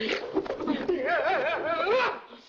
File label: spinning